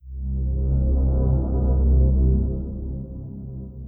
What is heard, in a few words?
bass swell; BB 2HTC Samples Vol 4; Weird